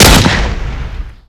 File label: fg42 shoot; svencoop; ww2projekt